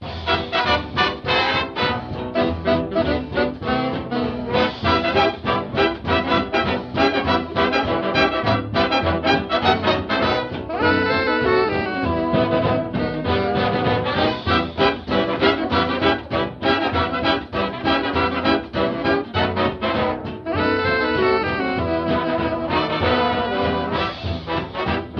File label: funky music